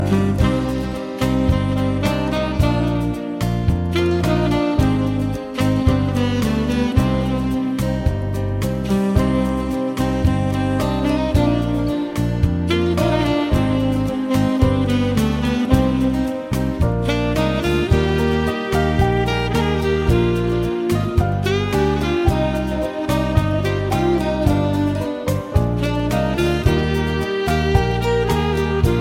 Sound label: dance floor, hip hop ringtones